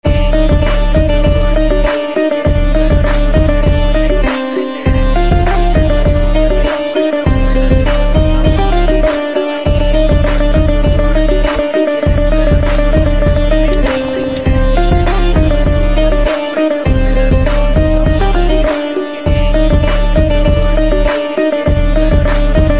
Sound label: Banjo with Band; devotional instrumental ringtone